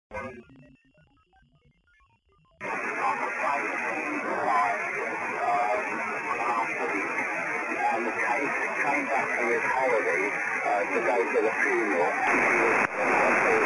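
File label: 80 circular polarization noise reduction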